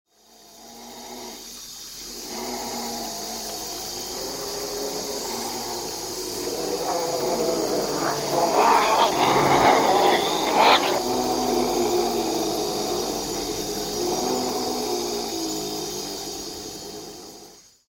Ozelot, regenwald ecuador; abenteuer regenwald ecuador

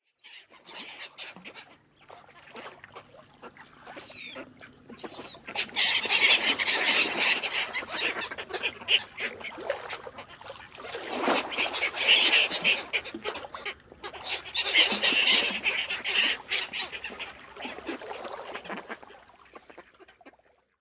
Vogelfuetterung am Wasser; Geraeusche; Vogelfutterung am Wasser

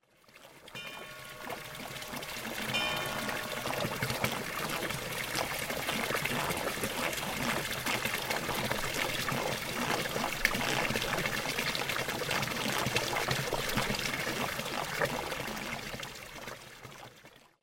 Brunnen und andere Wasser; Tinnitus; tinnitus erfolgreich beeinflussen

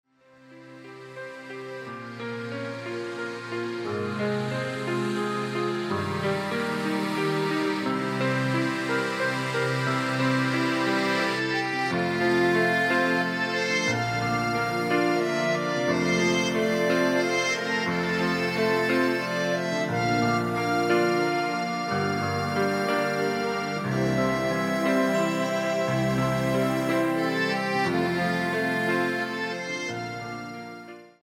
Instrumentalmusik Meeresrauschen; vogelstimmen am meer

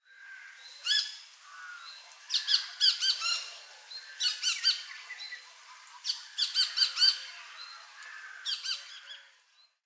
PIROL Gickern; vogelportraet; oriolus oriolus

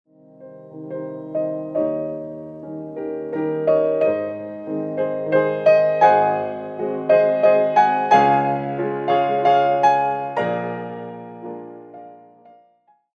Walzer As-Dur; Brahms; klassik erkennen der neue weg zur klassik

